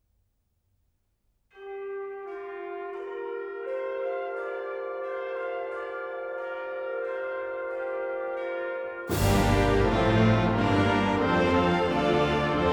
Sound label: Concerto for Piano and Orchestra